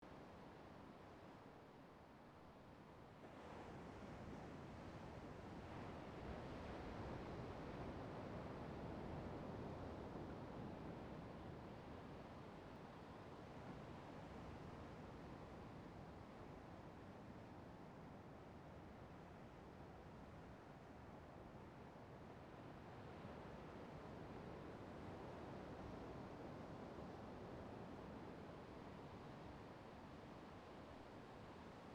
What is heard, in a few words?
Guitar Siren